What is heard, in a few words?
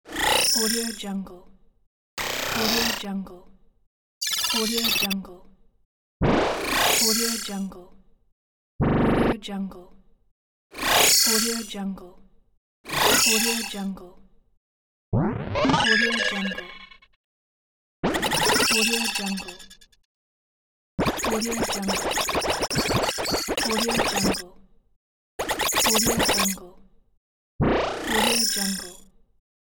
cybernetic electronic sfx